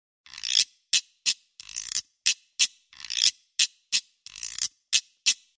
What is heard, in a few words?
Guiro